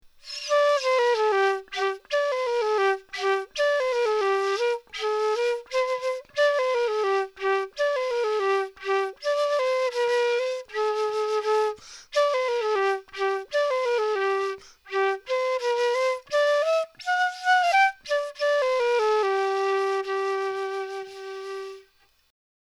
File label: alto bamboo flute